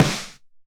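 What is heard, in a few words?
snare drum Acoustic Chorus Lo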